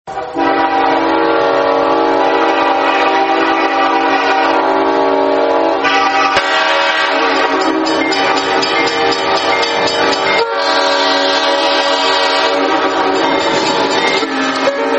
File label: train